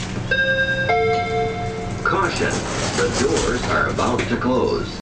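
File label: metro door close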